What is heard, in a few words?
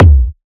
mid heavy kick sound c key